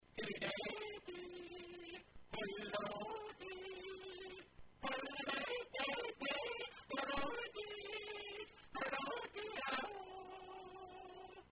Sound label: Yodel